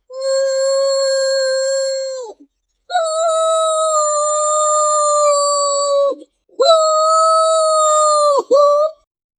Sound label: monkey uuaa